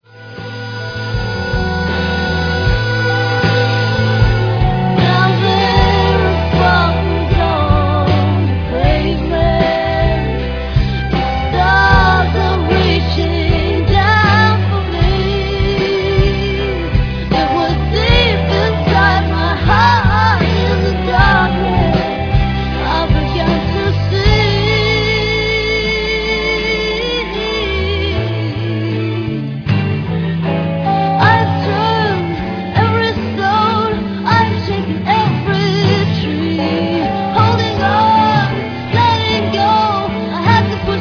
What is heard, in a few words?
begging bowl